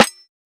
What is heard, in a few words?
snare a key, Royality free snare sound tuned to the A note. Loudest frequency: 2437Hz; drum single hits one shots